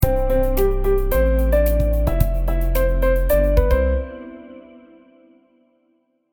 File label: soundlogo piano; Inside Martin